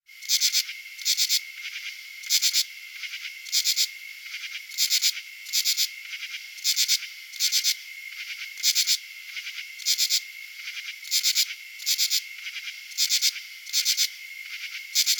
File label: northern true katydid male warm